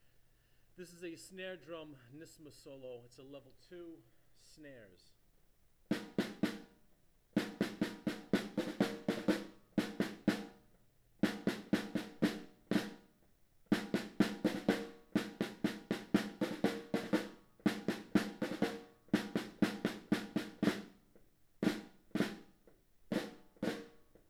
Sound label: snare drum solo; nyssma